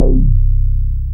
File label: BAS MINI, Mini-Moog